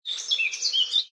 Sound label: smallbird; Source Engine; tf; rottenburg